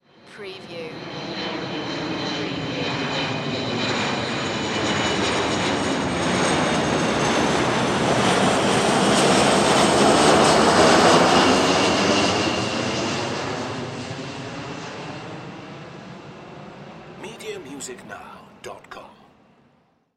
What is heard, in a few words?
Jet Kc135 Pass By; Sound Effects Bible